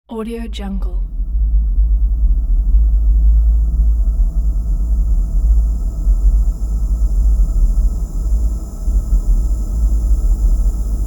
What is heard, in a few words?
unexplained rumble